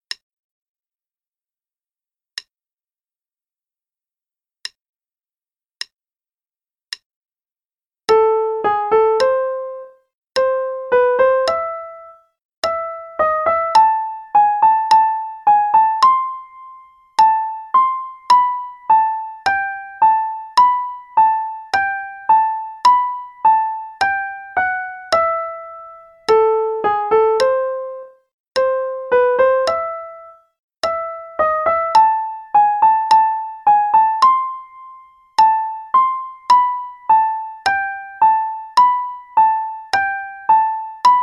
Play-along - Rondo alla Turca, RH only, qn=54, piano; Mozart; Chwalik versions of classical music